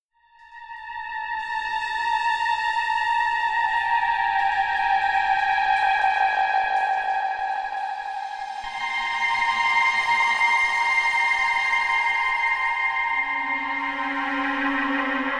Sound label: violent viola